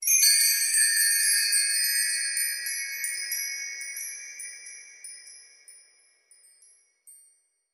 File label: Magic Cheer Short Chimes